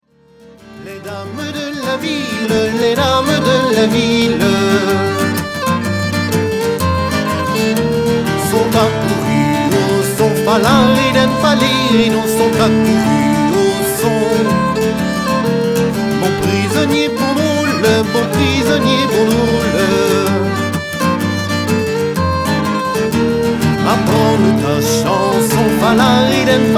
bal paludier

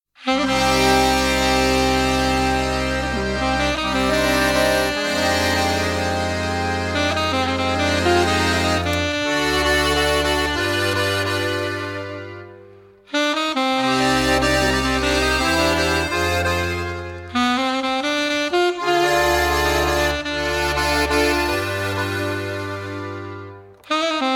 gavotte ton simple

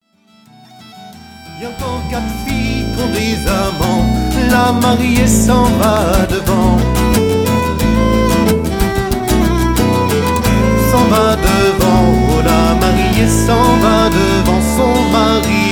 rond de sautron

